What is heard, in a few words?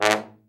TROMB; Best Service Gigapack 1; BR TROM